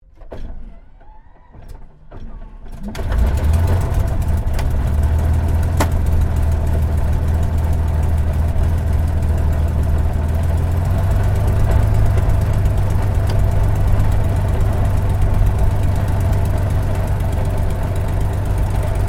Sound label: AIRCRAFT SUPERMARINE SPITFIRE MK-VIII STARTUP INTERIOR